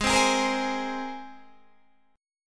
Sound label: G sharp Major Add 9th G sharp, Guitar DADGAD; G#add9 Chord